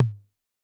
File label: Tom; ElectroKit4